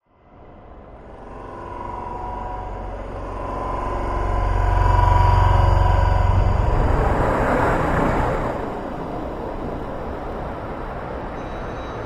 Evolution gritty metal scrapes with low whooshing watery effects; granular